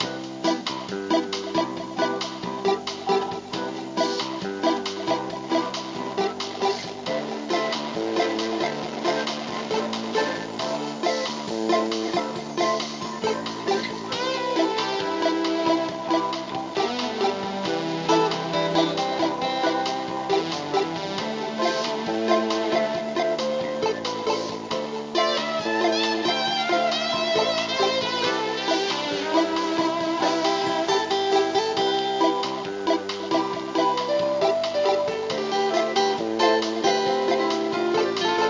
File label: Radio; Summer Catchers